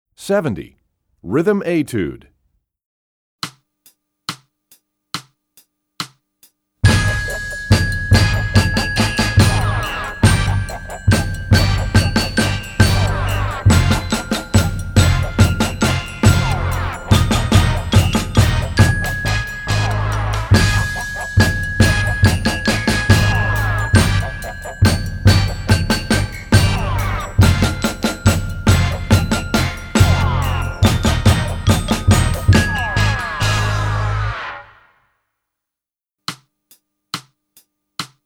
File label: Rhythm Etude, Percussion; EE2000 Book2